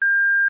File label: beep